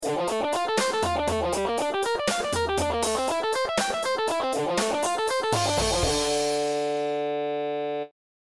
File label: Arpeggios fast Playing exercise; Scales and Arpeggios; Arpeggios Based Dm